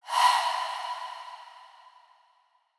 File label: Whoosh Breath; Audio Toolkit